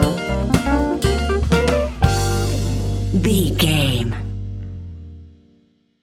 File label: disco stinger